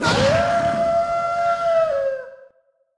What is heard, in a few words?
scatter Landed; the wolfs night